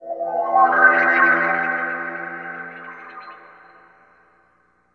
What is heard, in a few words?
ufo; DirectX SDK; DMusProd